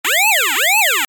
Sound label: yelp